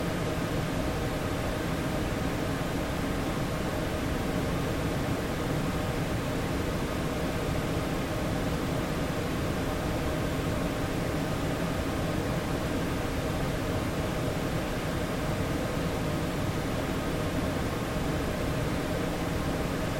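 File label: calibration noise by level and frequency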